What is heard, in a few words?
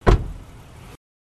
Car Door Close Outside